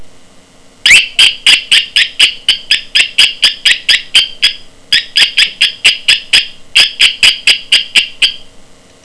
parrot screech

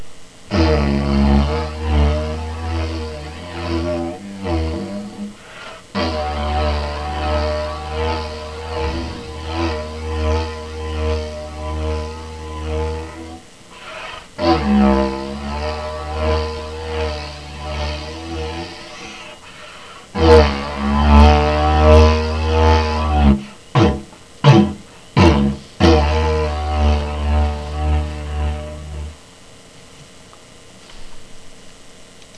flex hose didge

